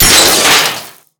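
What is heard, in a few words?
metal panel; Explosions; modern warfare 2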